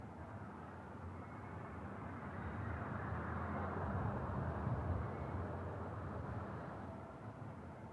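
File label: desert loop light wind; ambient; atmosphere; Counter Strike Model Pack; CS:GO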